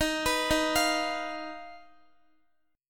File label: D sharp Suspended 2nd Sharp 5th D sharp Guitar; D#sus2#5; arpeggiated; x,x,15,16,15,13